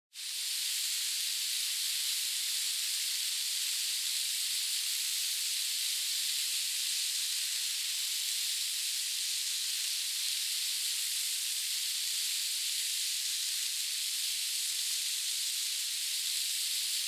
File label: Hourglass 30 Seconds; 砂時計02-1(30秒); タイマー機器